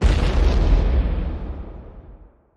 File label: plane explodes; Tailgunner